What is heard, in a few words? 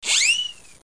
ZING